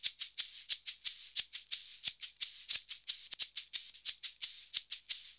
SHAKER, Modern Percussion Loops

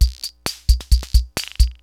UNIVOX LOOPS; Rubber Chicken Software Sample Bank

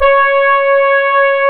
C#, Synclav FM, BRASS; Big Fish Audio

